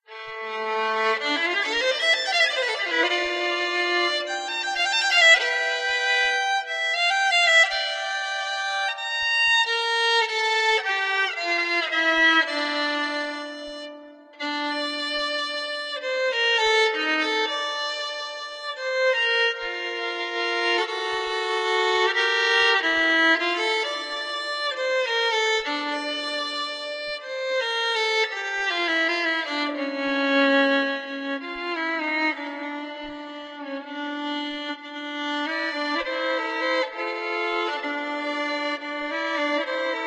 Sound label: aggrieve violin refrain; homestuck